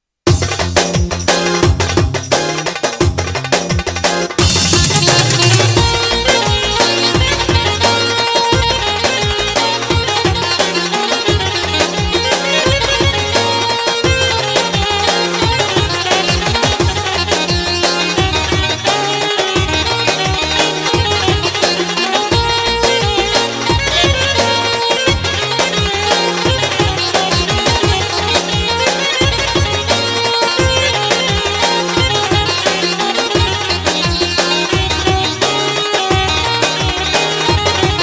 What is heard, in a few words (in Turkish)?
ciftetelli, roland gw8